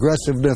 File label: aggressniff